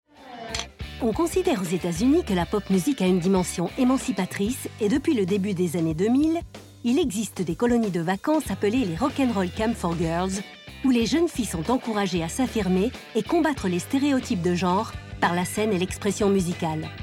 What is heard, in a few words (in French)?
Pop music - energique, voix parlées documentaire